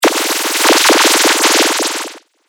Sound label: Laser Vaporizer, laser gun, sci-fi laser sound effect; Laser Future